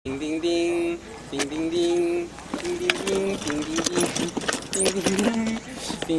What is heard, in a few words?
Ding Ding Ding